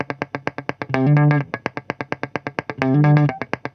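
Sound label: guitar, Roots Disco; USB Soundscan vol.32; AKAI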